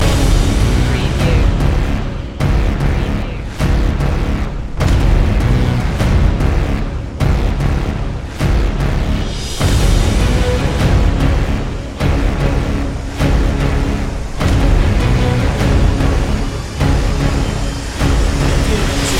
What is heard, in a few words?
battlefield loop